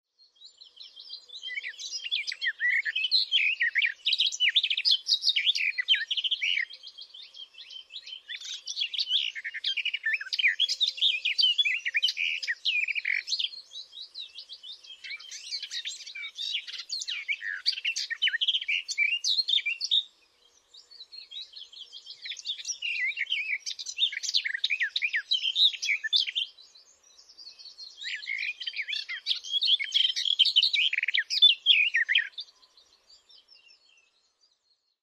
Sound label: garden warbler